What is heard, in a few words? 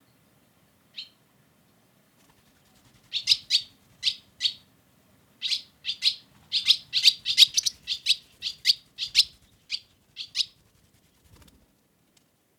Barn Swallow, Hirundo rustica; swallows swifts